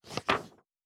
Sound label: Book Page; Fantasy Sounds Bundle